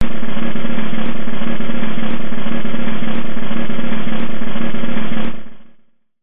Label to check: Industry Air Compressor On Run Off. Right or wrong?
Wrong